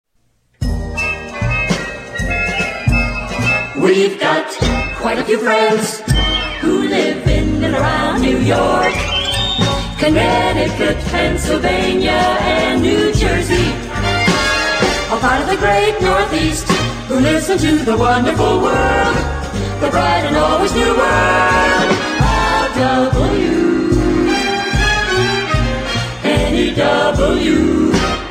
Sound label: Radio Jingle; WNEW AM 1130 NY